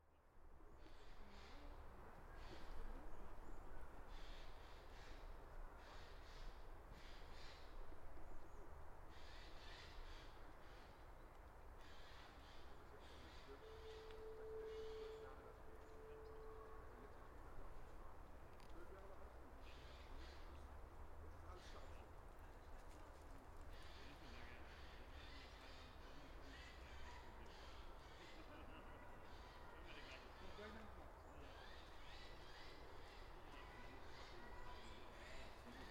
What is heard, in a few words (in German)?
lindenpark